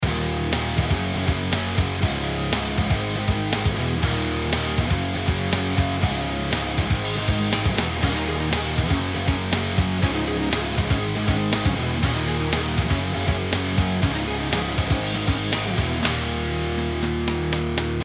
orange vintage, Mesa rectifier, Ampli et préampli guitare

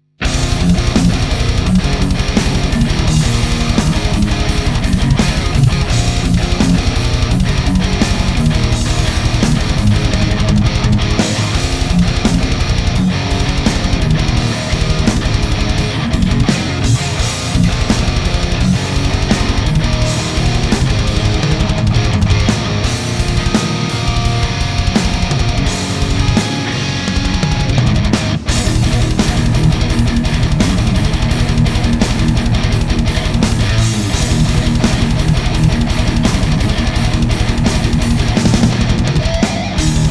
faible, Mesa rectifier, ampli guitare; Bias à 70%, master à 11h, presence, bass et trebble à 14h, mid à 11h, gain à 14h; orange

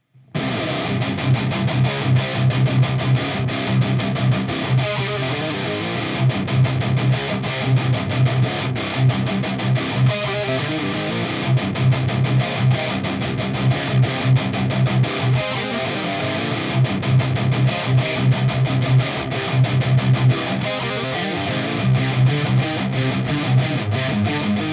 test, Mesa rectifier, ampli guitare

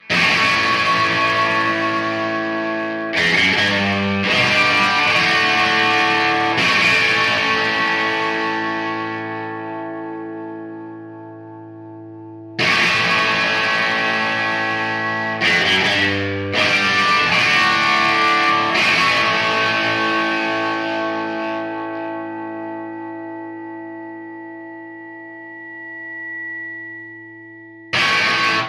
Suhr Classic S et Marshall Superlead avec bright et deep sur hotplate; guitare

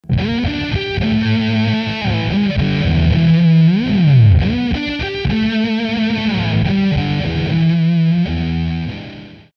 fuzz

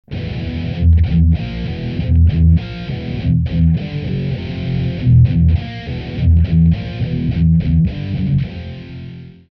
grosse disto

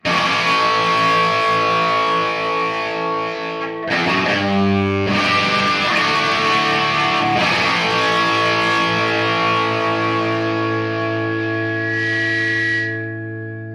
Les Paul Jr et Marshall Superlead avec bright et deep sur hotplate; guitare

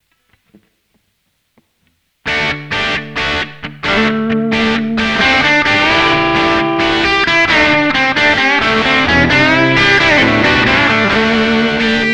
Un petit mixage, avec reverb, compression et EQ; Amplug AC30

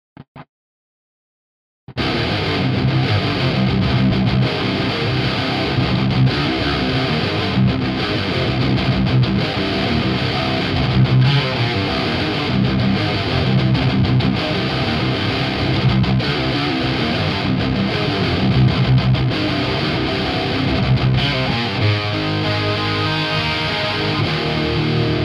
AMT R1 Tout a 12h sauf gain 15h, Effet guitare; tout potards à 12:00 sauf gain 15:00

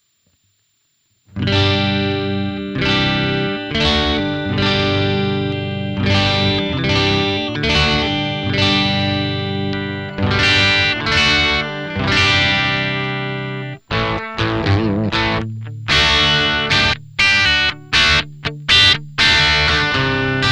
Solo Crunch, ampli guitare; Amplug Classic; sans effet

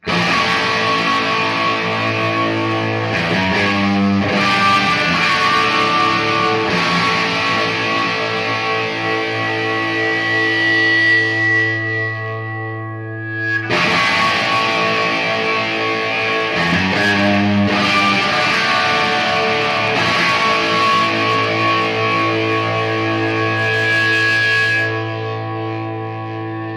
Les Paul R8 et Marshall Superlead; guitare

